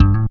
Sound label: BASS FX; E-Lab; X Static Goldmine 2; akai